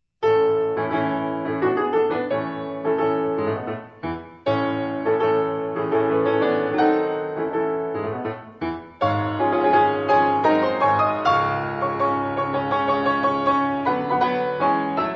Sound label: Chopin Solo Piano